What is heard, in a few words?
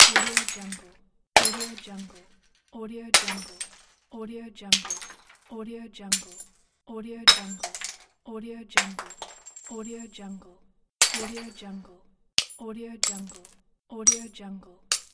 glass breaks pack; urbazon